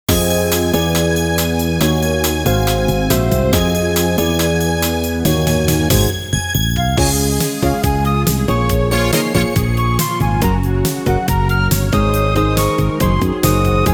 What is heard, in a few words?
Success Spring BGM